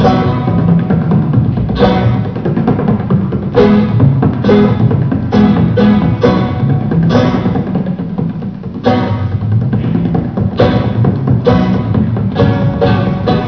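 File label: bodhran; schlagsait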